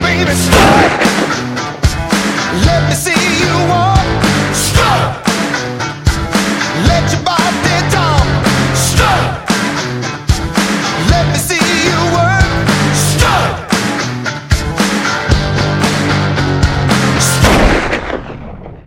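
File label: good old classic rock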